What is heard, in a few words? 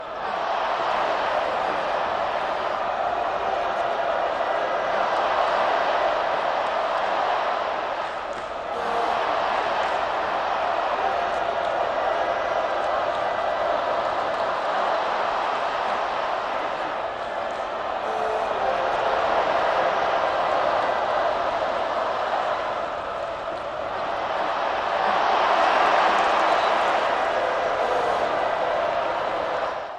soccer sound track